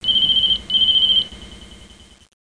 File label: C RING; Interactive Manchester United (2000)(Alternative Software)